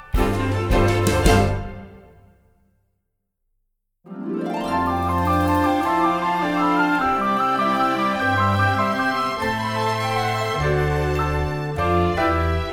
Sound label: without vocals